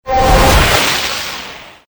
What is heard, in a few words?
gun